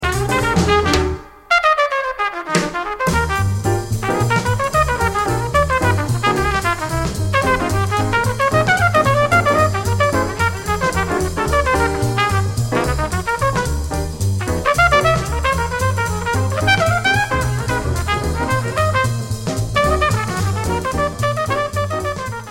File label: solo